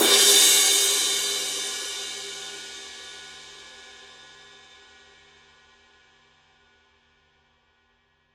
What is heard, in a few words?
paiste signature 20 full crash edge